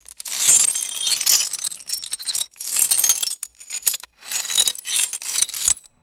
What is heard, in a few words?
shattered glass swirling and rubbing